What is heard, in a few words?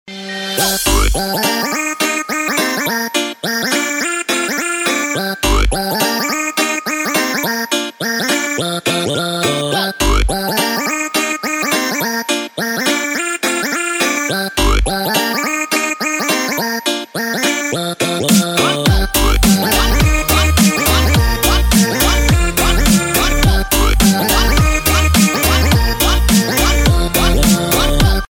voice funny dance tone